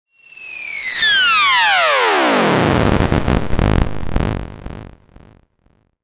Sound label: re entry high pitched descending whine delayed